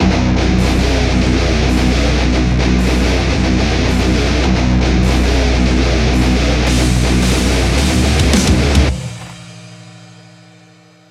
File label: Music Song Intro; Hellbound